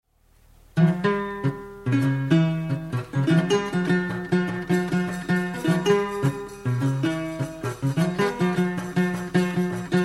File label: NGoni